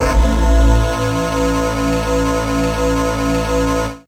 syn, Synclavi, Fairlight, Retro Sampler; Best Service ProSamples vol.55 - Retro Sampler

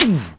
DOOH; AMG

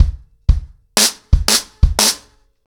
90BPM, acoustic drums, drumloops; The Roots Drumloops

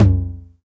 909 TOM; Techno Trance; Perc; E-MU Formula 4000 Series Vol. 2; OrbitPercMaster

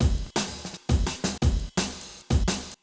breakcore breaks

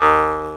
Jaw Harp Aa; Planet Earth; Digital Sound Factory Planet Earth

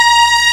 FAIRLIGHT CMI IIX, STRINGS

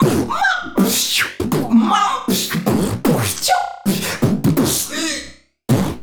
Beat Box, Hip-Hop and RnB Vocals

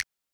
finger snap; Giga Samples Collection; RY30

